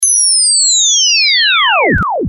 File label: Pack stop Digital